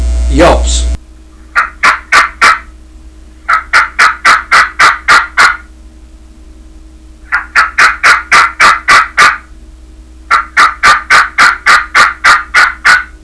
dev yelps, turkey; dixie

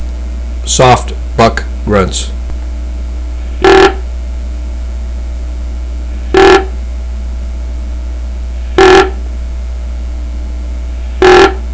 grunt soft; pro grunt master

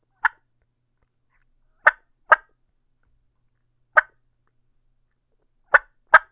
supermouth call clucks, turkey; dixie

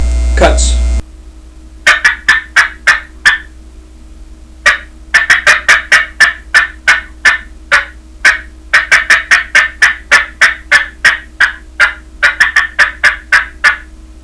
dev cutts, turkey; dixie

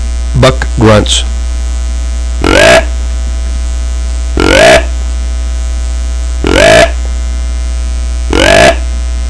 buck

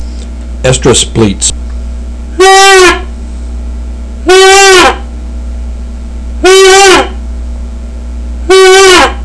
big buck estrus bleats; primos

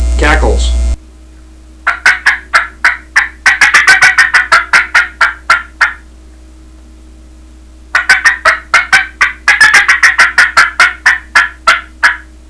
cackles, turkey; dixie

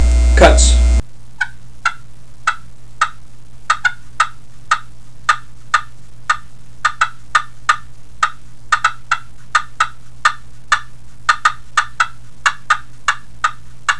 alum cutts, turkey; dixie

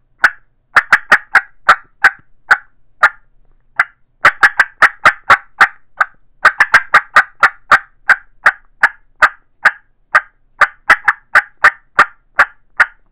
cuttin edge mouth call cutts, turkey; Southland Sounds

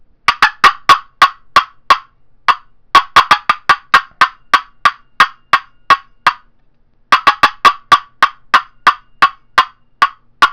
golden deluxe cutts, turkey; Southland Sounds

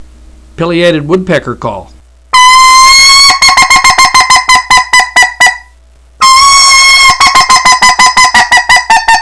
woodpecker; dixie

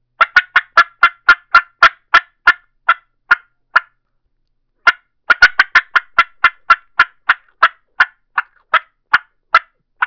supermouth call cutts, turkey; dixie

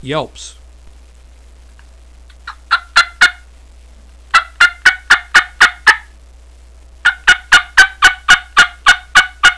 yelps, turkey

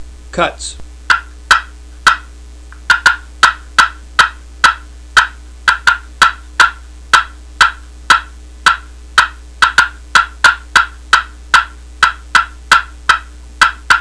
slate cutts, turkey

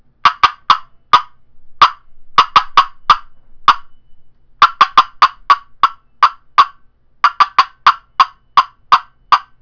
poplar cherry box call cutts, turkey; Southland Sounds

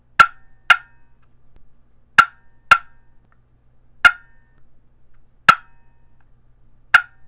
cedar cherry box call clucks, turkey; Southland Sounds

